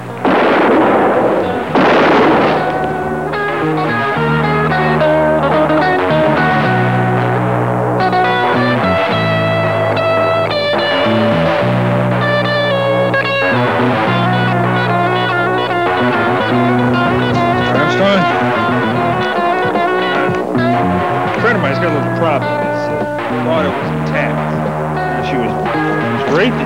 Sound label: Guitar Synth Jam